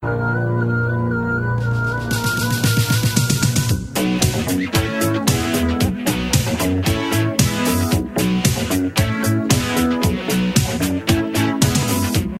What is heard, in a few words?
pumpkin dance; fortnite emotes